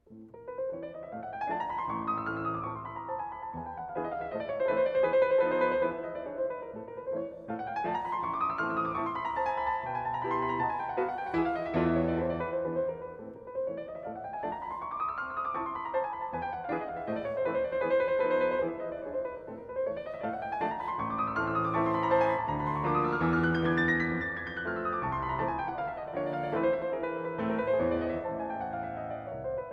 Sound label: Etude Op. 10 No. 2 in A minor piano; F.Chopin